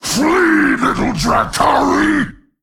Male Rhino Play

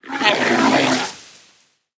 Vilespine Slayer Death

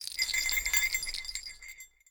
Tiny Bells Death Underlay

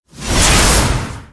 Spell Sacred Trial cast

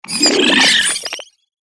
Enter Play

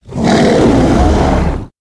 Doomkin Play

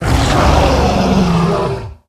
Devilsaur Death

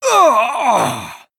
Death

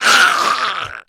Raptor Hatchling Attack

